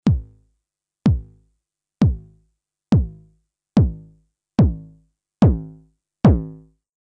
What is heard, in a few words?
Roland TR-909 BD Mod Drive; Synths